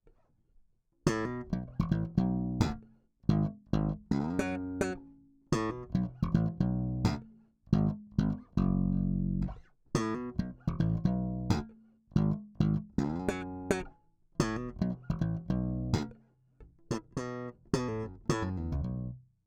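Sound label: slap S2 both pickups solo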